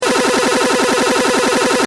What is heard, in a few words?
Laser: Firing; Lasers